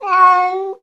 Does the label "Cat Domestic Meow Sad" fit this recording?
Yes